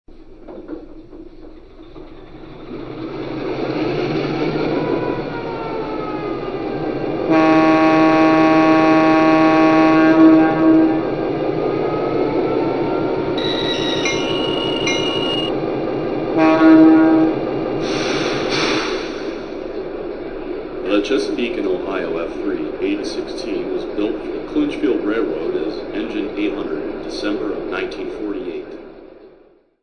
F3, trains

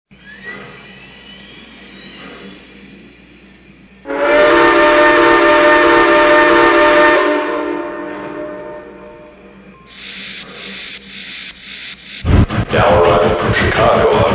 484 FEF, trains; 839

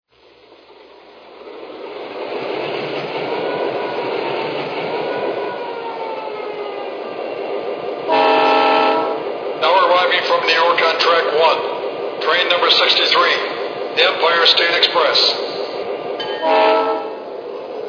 E8, trains

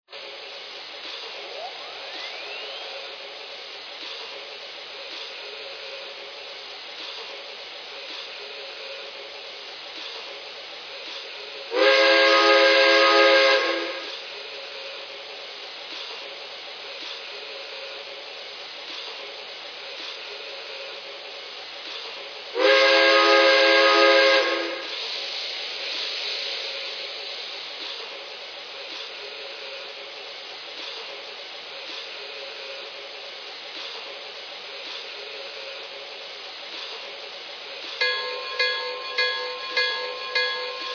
t1

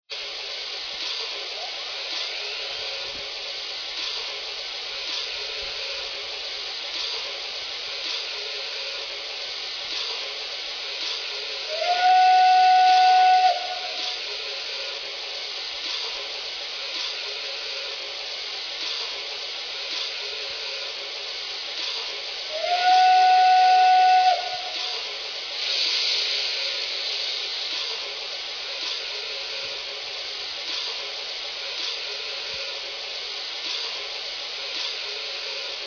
mikado

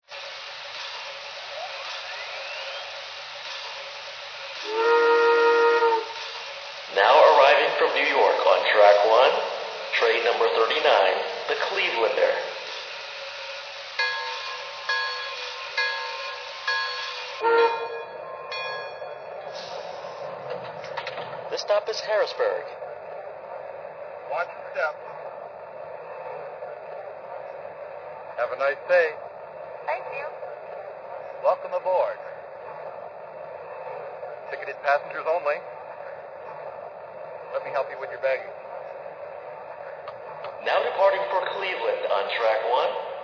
m1a; 75

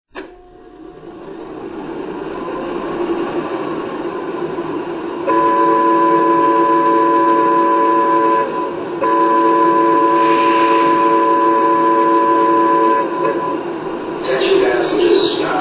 EP5

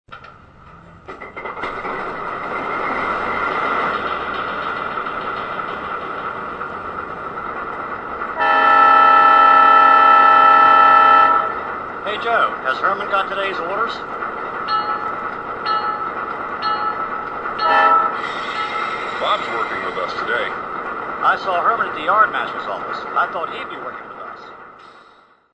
Alco FA-2

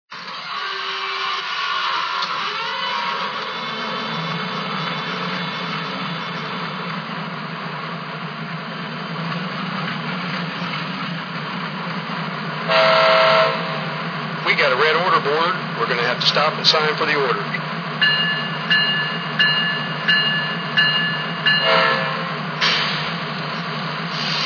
u25b, trains; CR

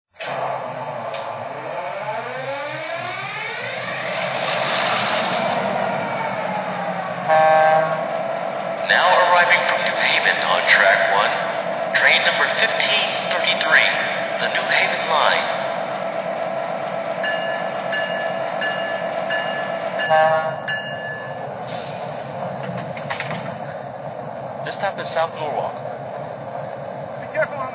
f3 aba, trains

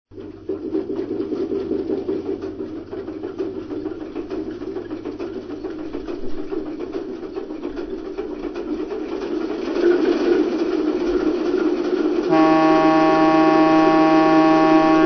Alco PA

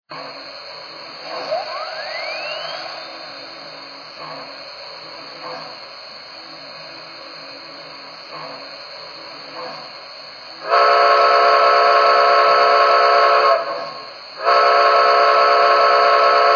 mohawk, trains

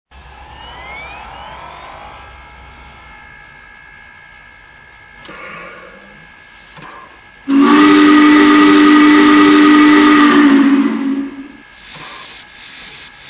HIAWAT

